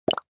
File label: toggle on